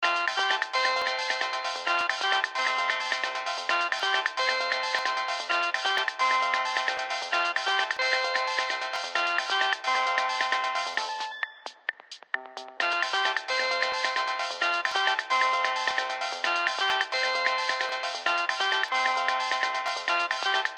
conga song; Strong Bad's Cool Game for Attractive People

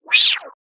hedge react

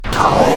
Heat Beam Laser Lined Disappear

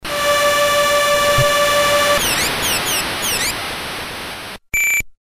Goal; Goal! Two; nes

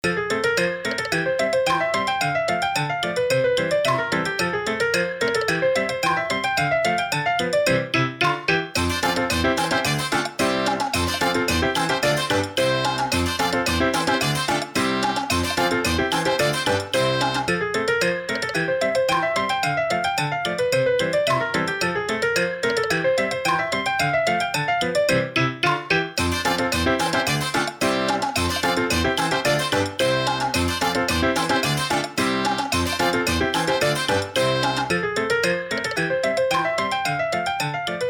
jingle tutorial; Taiko no Tatsujin Drum Session; Session de Dodon ga Don; PS4

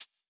tile

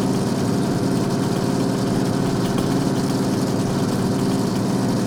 buggy gear

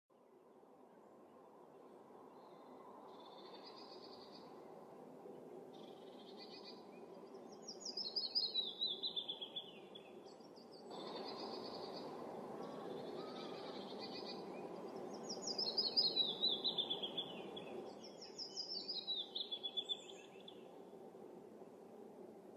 Long Birds; junk jack mobile

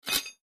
Lock Destroying; my cute commissar 2020 gamerip

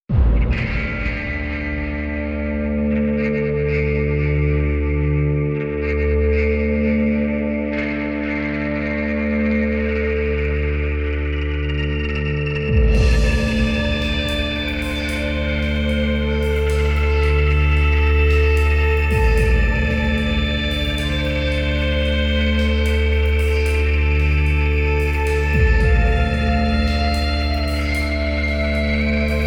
Edgy Ambience; X3 Gold Edition

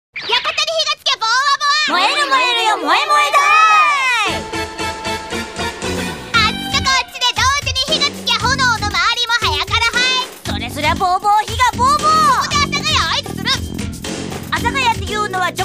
hip pop mambo; Kodomo no Omocha

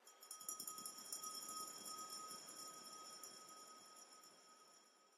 Amb Space race Crystals

